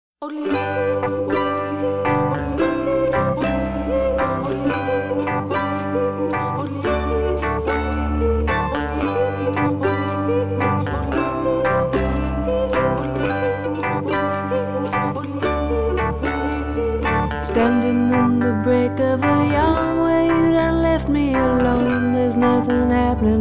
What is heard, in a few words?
Yodel